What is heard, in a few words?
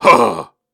Grunts and Chants, Viking Vocal